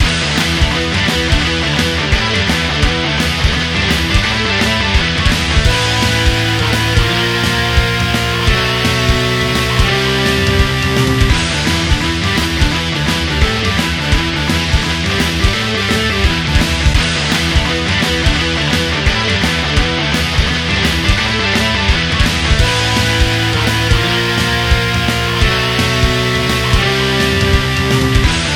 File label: 3d Music